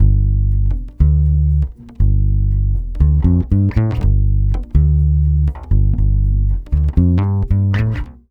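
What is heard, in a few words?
120 BASS G minor, Brazil Bossa; USB Soundscan; AKAI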